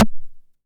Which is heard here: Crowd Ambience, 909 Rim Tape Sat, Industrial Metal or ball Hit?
909 Rim Tape Sat